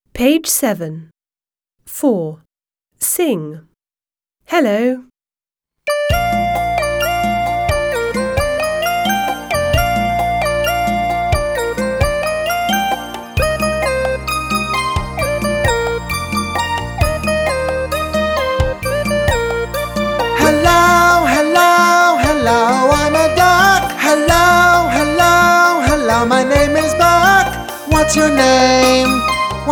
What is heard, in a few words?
song Hello, British; My alphabet book; Smart Book 1